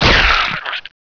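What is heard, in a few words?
rat death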